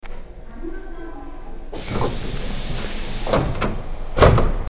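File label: door open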